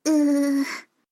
uuuuuu

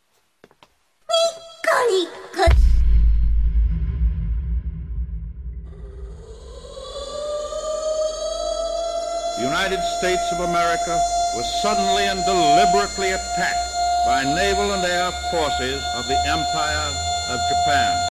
nico nico niiiiiiiiiiii air raid siren

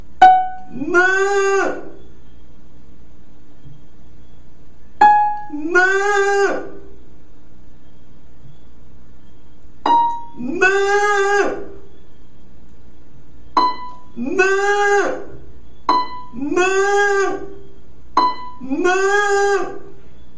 brazilian vocal range

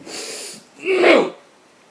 angry grunting

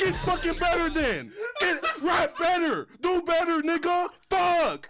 rage

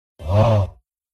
short augh

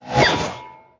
crystal planet shoot sound; angry birds space

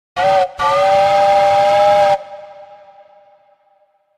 train whistle; train mania

